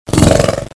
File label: hurt; Predator Mod